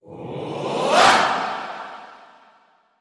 Symphony Choir Attack

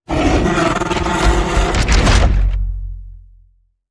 Not Human Howling Hit

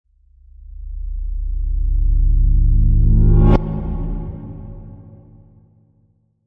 Quick Sweep

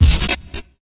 Gritty Boom Stutter

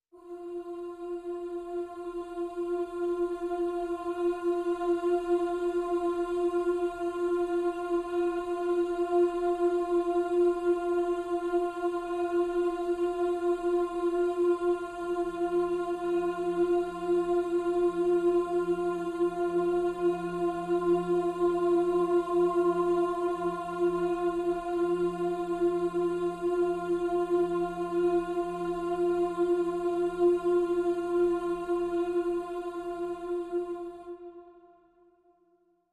Pads Vocalicious (F)

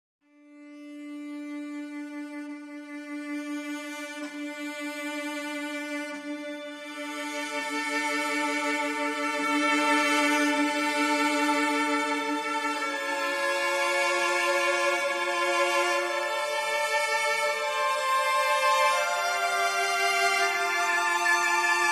Strings Only

